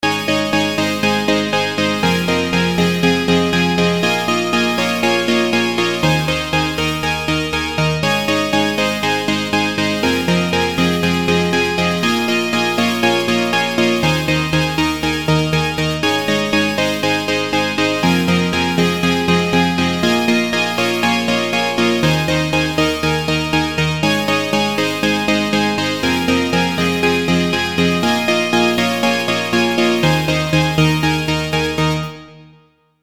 leaf plasma lead

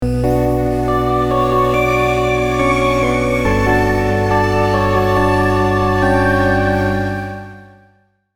before our time 140bpm; vitality vol 10

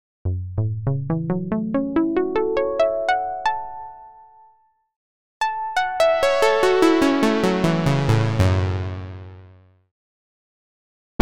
plucks; TN6-2024-replicants

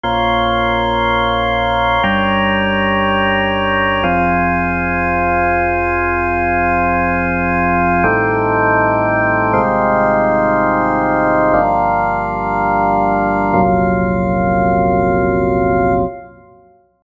velvet organ

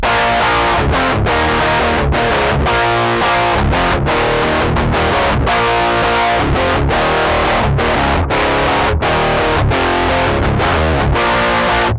punk style; tl distraptor; tonelib

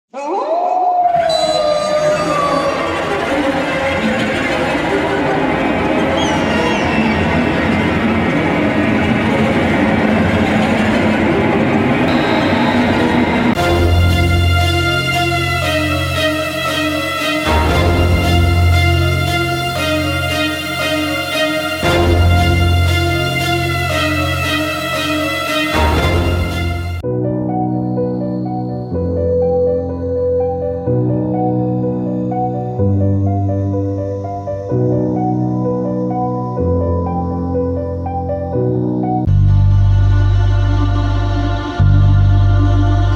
hollywood horror; soundtrack loops

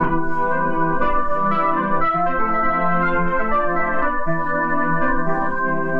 cassette lofi; ultimate vital bundle; rocket powered sound

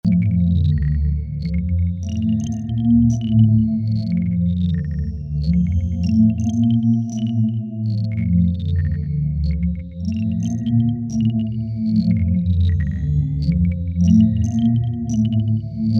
synth halide

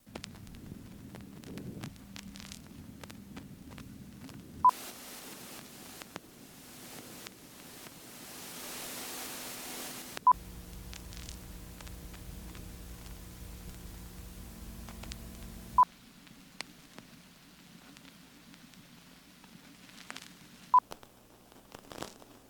lofi vinyl static; patchbanks

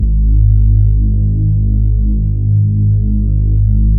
smooth reese processed; kern audio

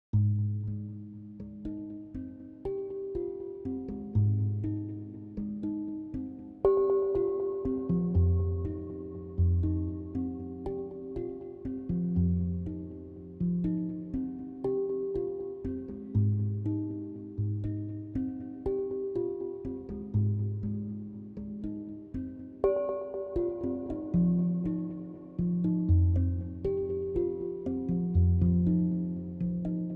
glockenmaschine aquatic; schallenberg engineering

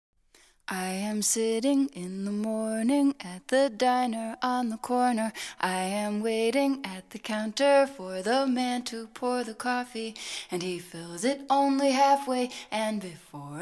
Golden Plate Ambience Vocals

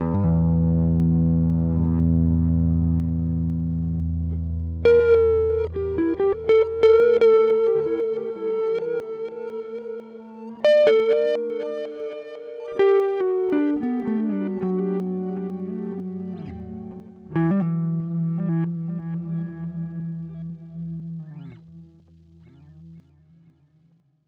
solo guitar bokeh, bidirectional reverb; sonic instruments

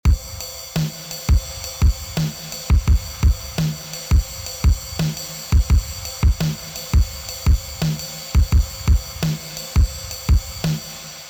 phonk drive drums wet; elektra magika

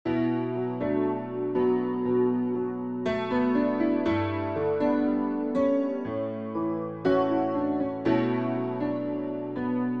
piano wet; foxfire; chiral audio

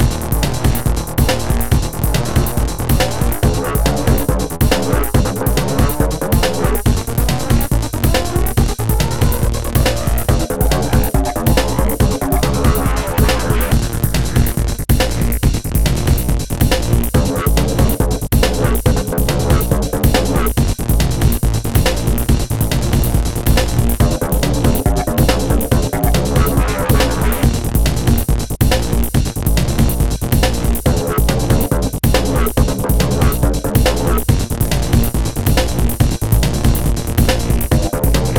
dog synth playground; linglin art

